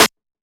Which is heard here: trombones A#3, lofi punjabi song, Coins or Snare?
Snare